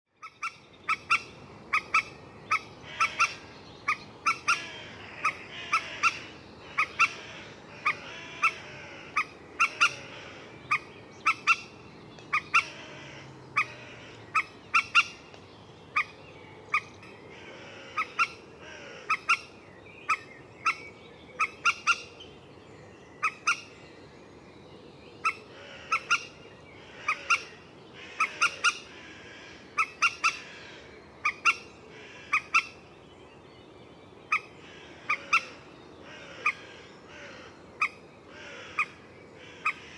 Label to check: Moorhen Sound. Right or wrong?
Right